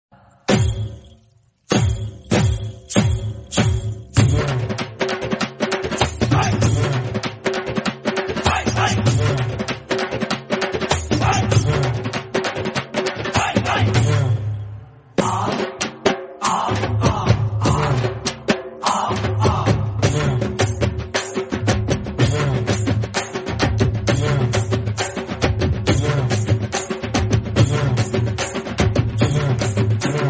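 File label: Mass background music ringtone; Desamuduru; Allu Arjun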